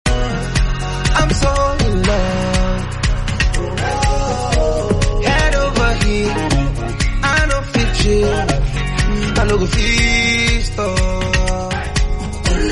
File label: Instrumental; Open Verse